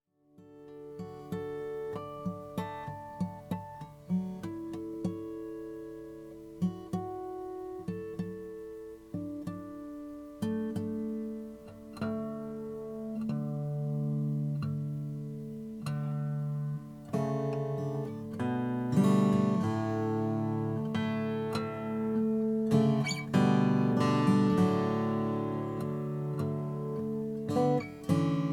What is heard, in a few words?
mcpherson mg4 0xp madagascar rosewood